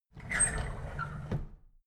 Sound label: drawer big squeak close regular